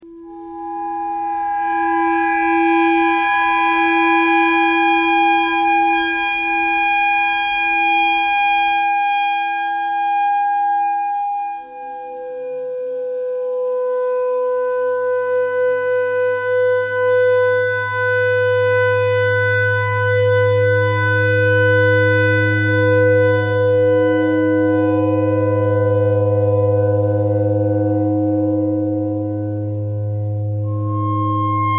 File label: clarinet notes e clarinet thread noise